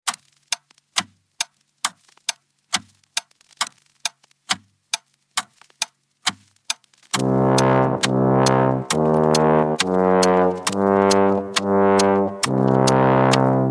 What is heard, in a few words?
balalaika saz; survival theme